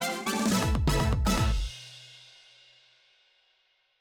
Mission

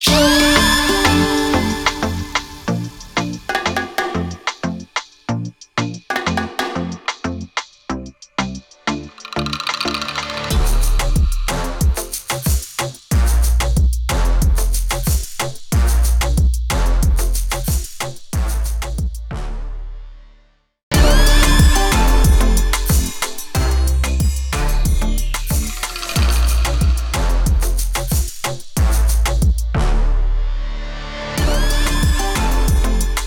In-Game Music; fast furious spy racers rise of shifter arctic challenge

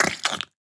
mud wet squirt splat; A Dump in the Dark; 2018 gamerip pc

